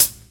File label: studio hat one shot f sharp key, Royality free high-hat sample tuned to the F# note; hat samples